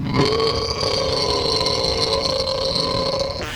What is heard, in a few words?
burp long